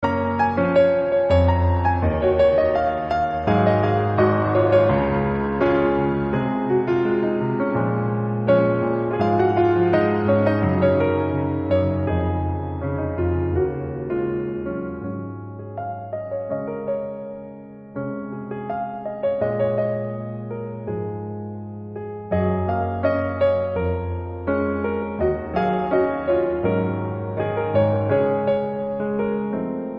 piano instrumental ringtone

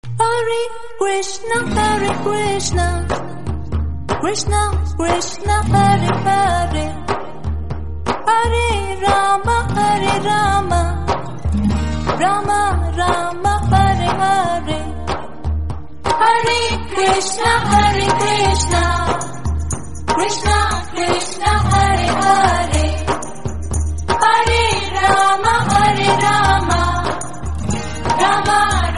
ISCON TEMPLE AARTI DEVOTIONAL RINGTONE

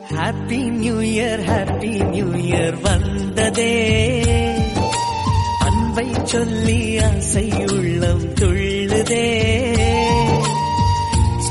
TAMIL SONG RINGTONE

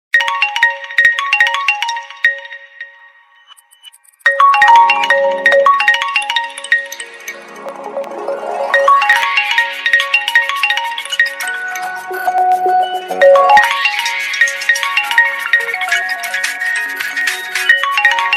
vivo tone ringtone

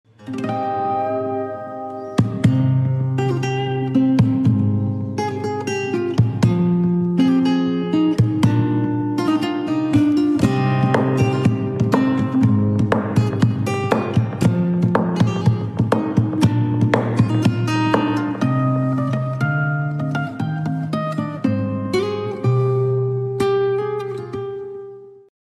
GUITAR RINGTONE

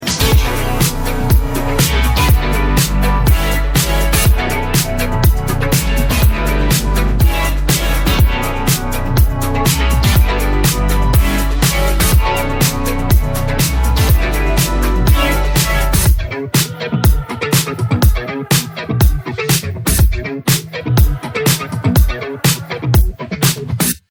instrumental ringtone

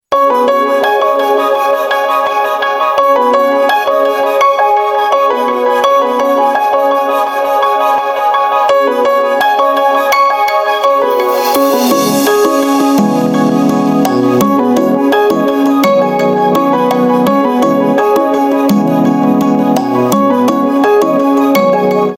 morning alarm ringtone

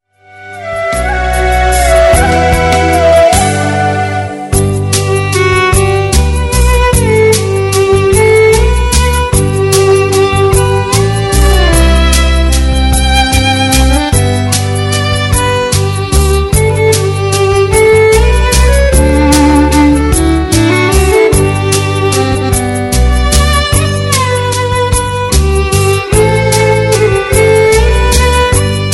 MUSIC RINGTONE

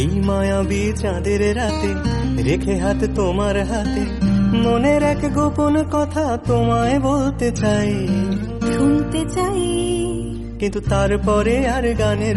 Bengali ringtone

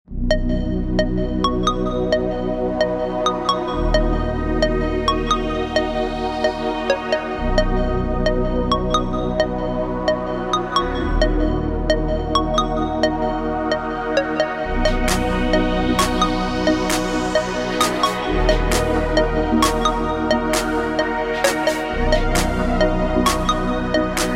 SONY ERICSSON THEME SMS RINGTONE

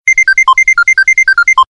business tone ringtone

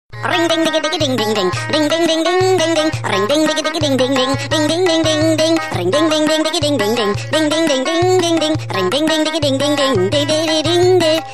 FROG FUNNY RINGTONE

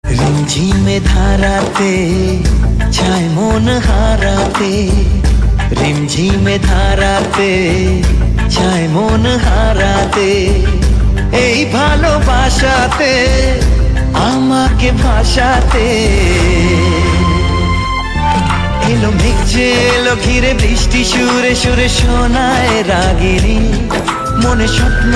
MALE SONG RINGTONE

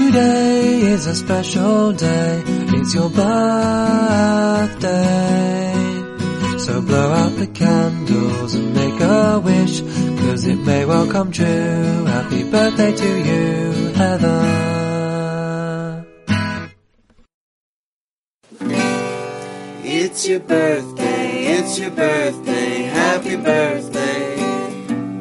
HAPPY BIRTHDAY SONG

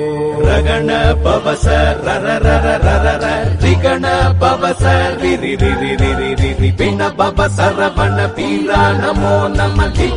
TAMIL KADAVUL MURUGAN RINGTONE

